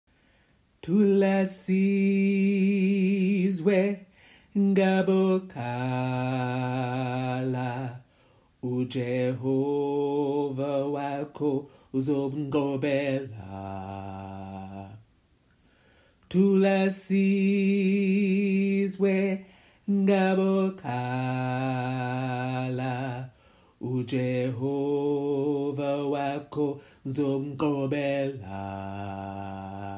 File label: Thula Sizwe Bass